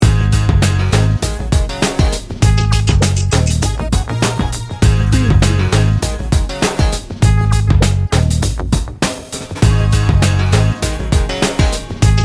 hip hop bounce

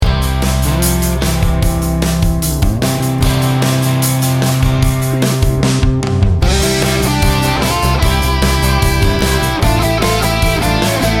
classic corporate rock

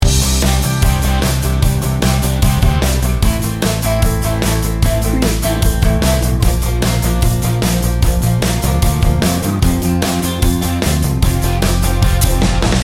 classic corporate rock loop

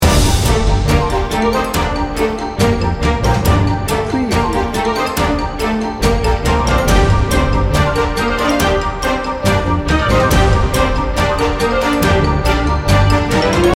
chasing the tiger loop

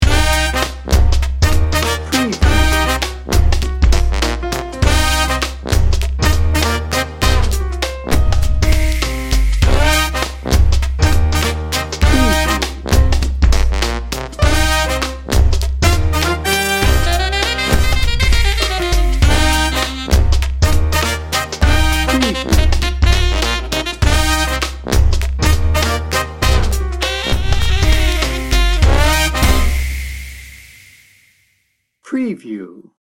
mardi gras funk

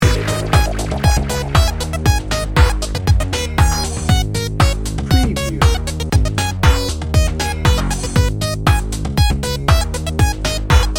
party chips

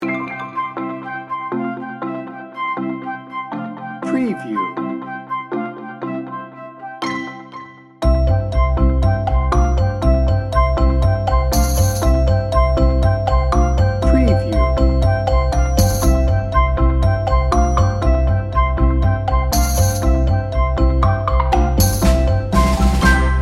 children marching

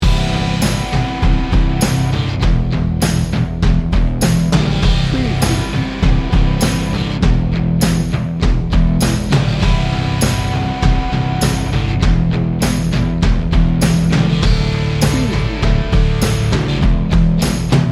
surfer down loop

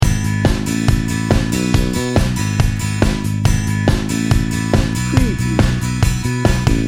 positive outlook loop

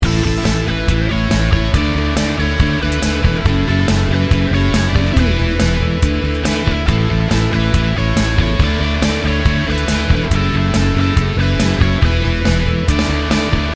indie rock party loop

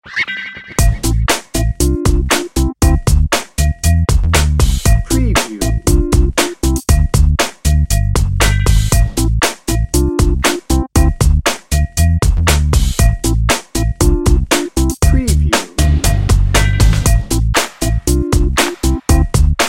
slick chick bed

